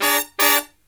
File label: Brass Riffs